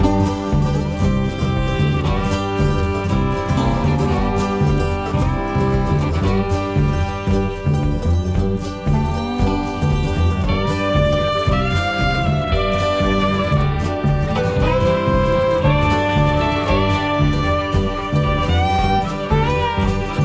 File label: Country Fried Cabin (Gameplay); Cooking Dash